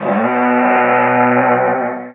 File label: vocal flare Bm; SD AURORA Sample Pack; Slate